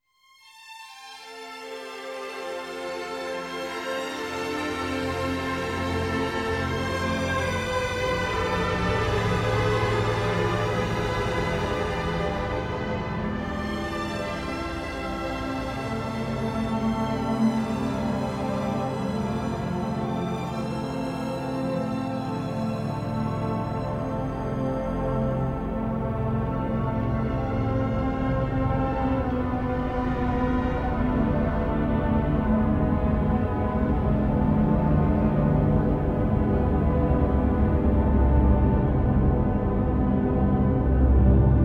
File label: String Orchestra